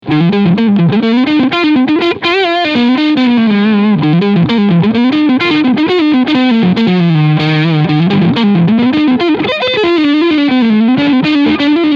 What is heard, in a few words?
Front PU Overdrive